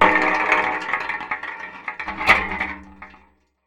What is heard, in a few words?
Tin Bucket, contact mic, Metalics